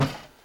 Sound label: Snare